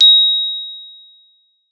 GLO A#5, GLOCKENSP; Xsample VOL-4

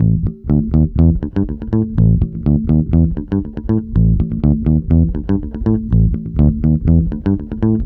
NICE BASSE, Roots Disco; USB Soundscan vol.32; AKAI

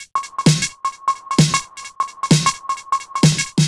130 Real Logic No Bd, Drum Loops 130bpm; Zero-G; Soundclash Nu Dance Electro; Acidized Wavs

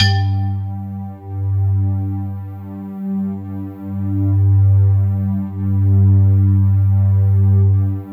PERCP, PERC PAD; Sound Gallery - Charged

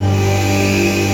NITROUS; NorthStar - The Wizard VOL-2; THE VOID

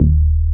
bass Croma D#2; Best Service Gigapack 2

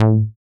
short bass; Masterbits; Dance Trax

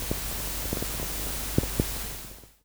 Tape Noise

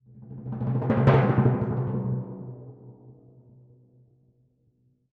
Crescendo-decrescendo C, Timpani; PowerFX; Downtown Orchestra

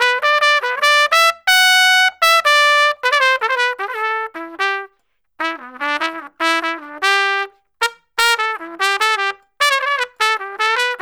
Trumpet Solo, Jazz Traditional

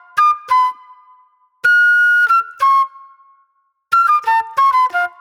Soul-Jazz Flute, Call And Response

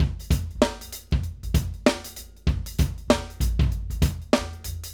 Acoustic Live Drum

